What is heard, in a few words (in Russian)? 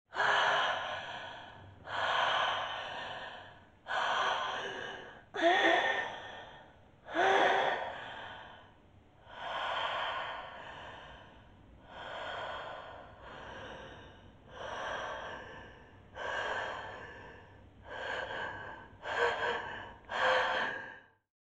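беспокойное дыхание женщины эффект обратное эхо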